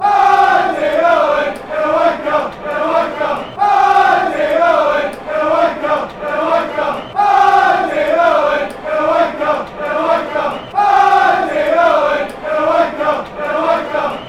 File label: kilmarnock chant